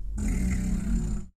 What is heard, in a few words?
truffler calm, utterance